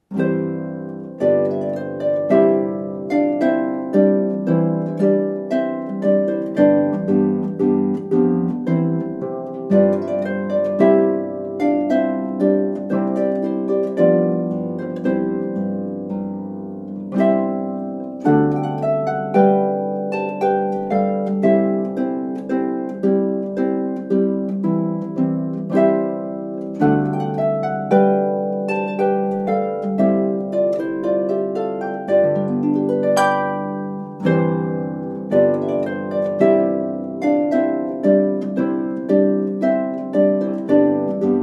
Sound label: trumpet Voluntary, upstateharpist